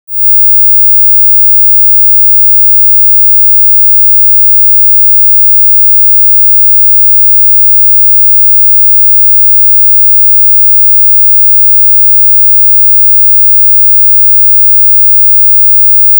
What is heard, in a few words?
100hz - 0 dB - Both - Noise; The Sheffield - Coustic - Set-up and Test Disc